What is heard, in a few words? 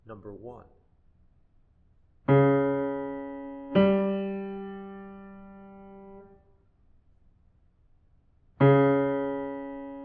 intervals